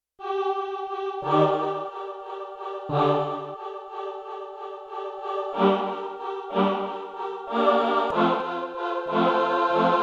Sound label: HARD-SOFT STRIKES, Choir, Yamaha TX16W